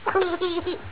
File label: baby Sound